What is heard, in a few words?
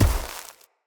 Step Veg, Footsteps; Enemy; Corrupted Sproutfolk